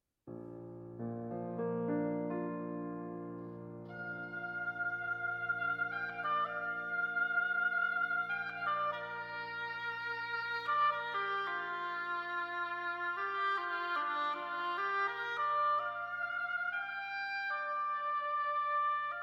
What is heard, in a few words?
Oboe Sonata in D Major II. Allegretto